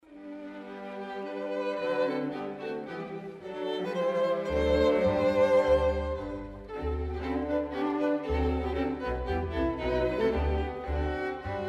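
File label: string quintet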